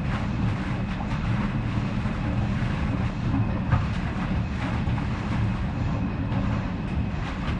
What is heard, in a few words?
swampland pipe; Titanfall 2